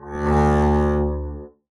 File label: arco d2 ff down, double bass